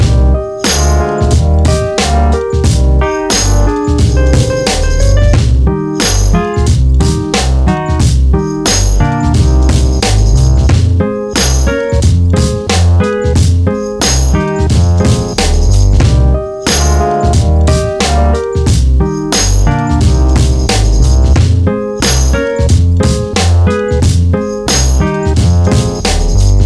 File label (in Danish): Kalve beat